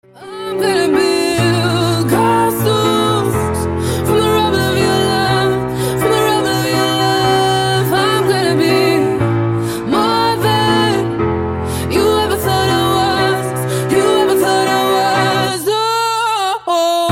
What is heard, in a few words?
castles acoustic ringtone